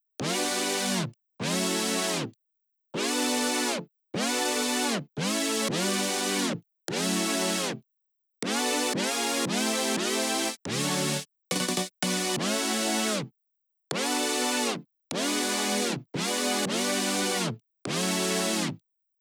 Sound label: Pad; SuperSaw; これは結構重要で、KawaiiFutureBassのKawaii感に関わってきます。; 音源自体はVitalで、OSCも2基だけなのですが、